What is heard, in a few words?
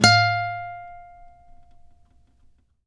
ACUSTC F 4, ACOUSTIC guitar; East West; Steve Stevens Guitar Samples Collection; ACOUSTIC PCK